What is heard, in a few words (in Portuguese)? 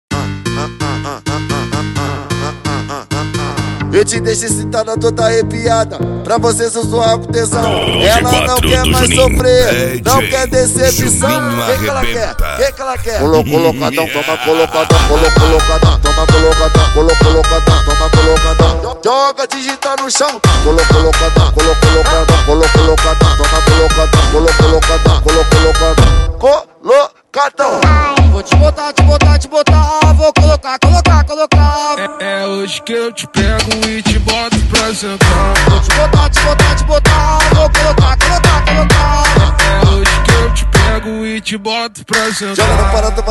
cd funk automotivo